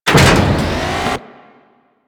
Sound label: Cloning Tube Start; Warframe; Grineer Ocean; Ocean Sabotage DNA